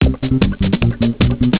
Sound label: COCKROACH